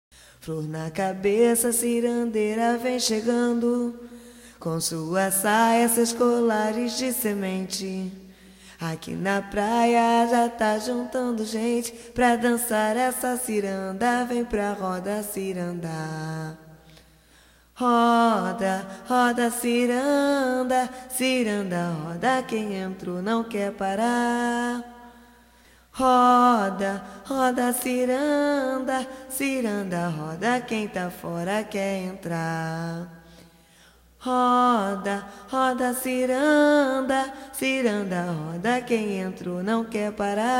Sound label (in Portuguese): Musica e Letra Roda Ciranda voz